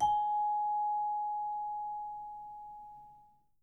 G#5, Percussion & Harp; Miroslav Vitous

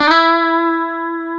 E 4, Guitars; Spectrasonics; Hans Zimmer Guitars VOL-1; 90's Sample CDs; COMP POP+VIB

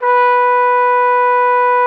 TROP B4, TRUMPET; Xsample VOL-3

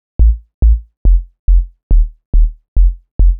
Bass Line; Eclectic Electronica

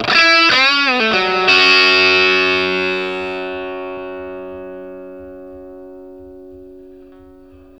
Vintage Blues Guitar, 60, FS; USB Soundscan vol.22; AKAI

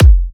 F, kicks; markus hakala kicks

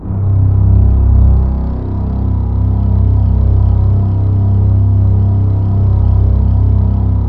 SAD CELLO, JV-1080 ORCHESTR; Syntec; Wall of Sounds VOL-2; ORCHESTR-EXP

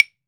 CLAVES; AKAI S-Series CD-ROM Sound Library VOL-5

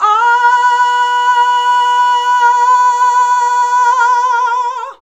VOX, Singin

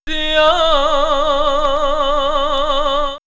Ethno Vox Islamic Prayer

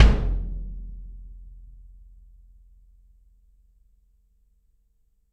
Kick Shard, Processed Drumkits; Sonic Foundry; Zero Gravity Beats; Shards

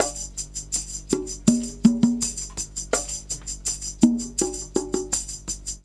Percussion, R&B Drums

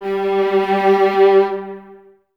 String Ensembles, Violas; Miroslav Vitous; LINKS ORCH

